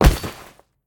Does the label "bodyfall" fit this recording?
Yes